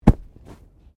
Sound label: Body movement Fall Medium; Body movement foley to simulate falls and deaths; Wrestling And Struggling Sound Effects